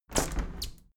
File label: window handle plastic squeak close regular; objekte; fenster